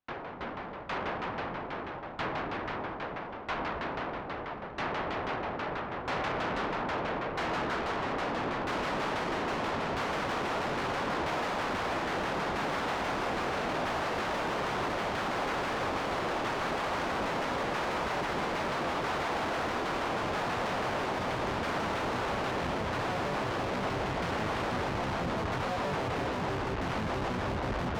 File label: Eventide H9 Dark Synthesizer